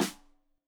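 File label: Snare Stereo, Drums; Deeply Sampled Drums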